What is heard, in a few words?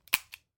Revolver Trigger Double Action; SoundsCrate